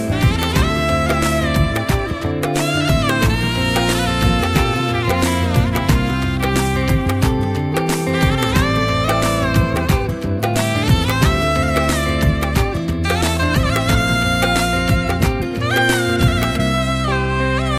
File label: nice music